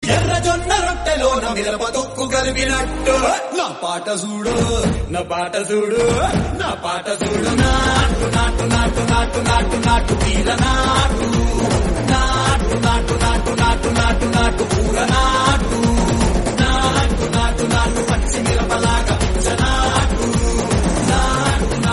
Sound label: Telugu Song; RRR